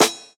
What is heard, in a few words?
Snare, jungle breaks drum sounds